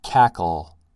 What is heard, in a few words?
cackle